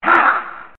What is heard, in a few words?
"ha" evil; ooh ahh